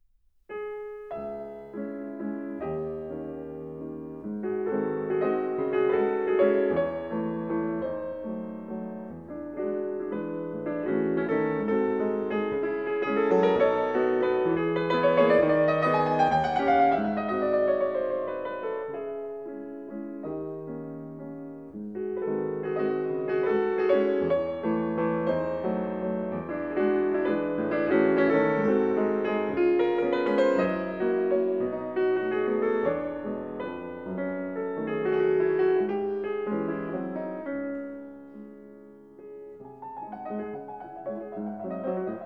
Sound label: Waltzes in C-Sharp Minor, Tempo giusto; Op. 64 No. 2